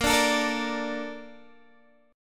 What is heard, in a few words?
A sharp Major 7th, Guitar Open D